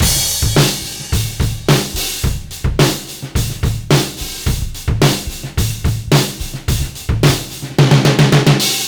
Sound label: Beat Loops; Zero-G; Monster Beats